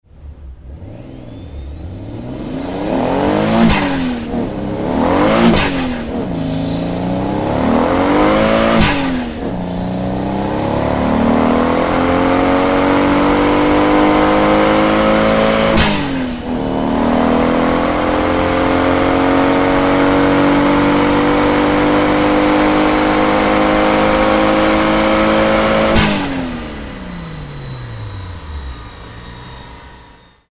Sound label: Supra freerun; TOY Supra 97; Dynos and Drag